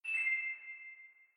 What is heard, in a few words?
particle drop; phet-io-wrapper-sonification; build-an-atom; cloud View